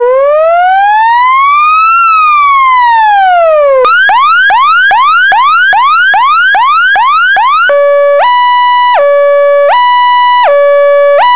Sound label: emergency electronic siren